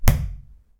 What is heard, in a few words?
hit concrete wall; knocking